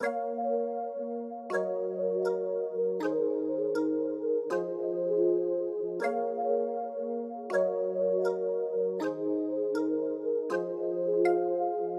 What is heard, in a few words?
Vibey Pluck New School 160bpm A min